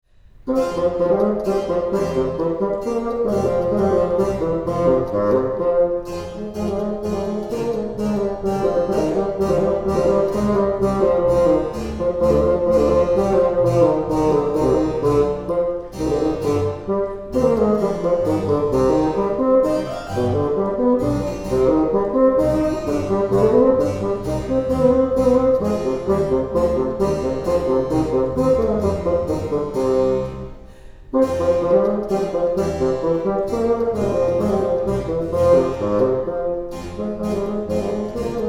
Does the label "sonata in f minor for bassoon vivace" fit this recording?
Yes